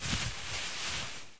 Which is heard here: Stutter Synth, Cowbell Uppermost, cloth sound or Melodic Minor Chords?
cloth sound